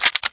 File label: m82 clip in